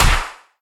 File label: right Pineapple Catch; HeavenStudioPlus; catchyTune